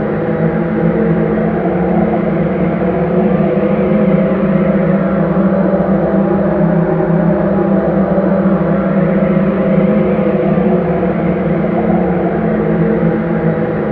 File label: spooky